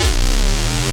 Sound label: noise 2, sound noise; NOISE+ALARM